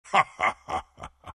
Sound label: bearform laugh; dota; lone druid